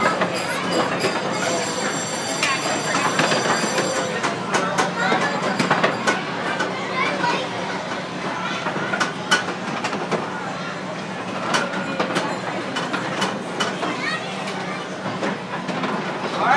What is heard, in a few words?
horse racing